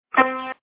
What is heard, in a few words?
real grand latency